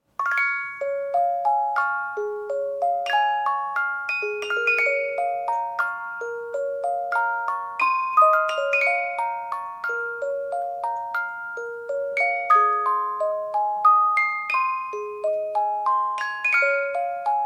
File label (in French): boites musique